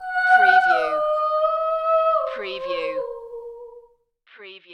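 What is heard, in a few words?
Female Opera Warm Up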